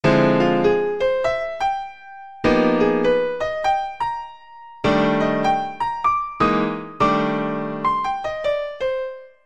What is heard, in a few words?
2 5 1, piano